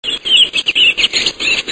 grive mauvis

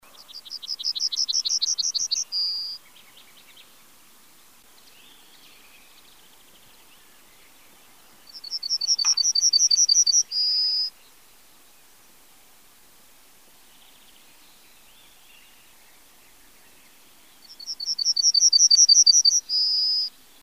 bruant jaune

